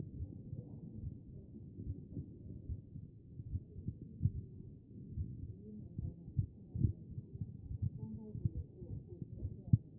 CHEST pos1 B MODE

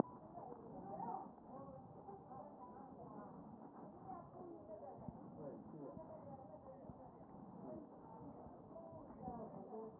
CHEST

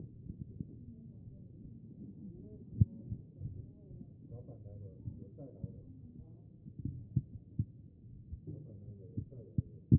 A CHEST; B MODE

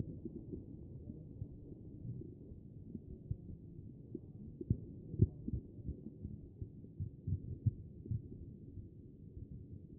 A CHEST; B MODE

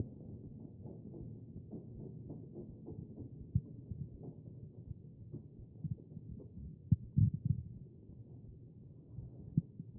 CHEST; B MODE

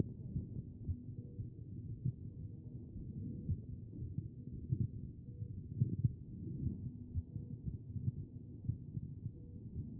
CHEST pos4 B MODE

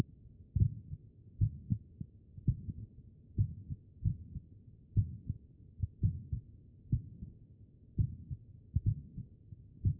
HEART; B MODE

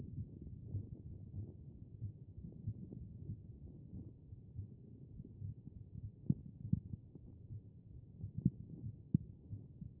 HEART; B MODE

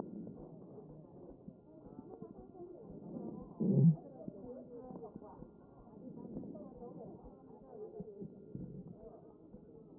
chest

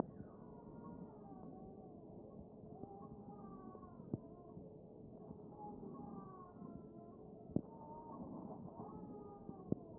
A CHEST

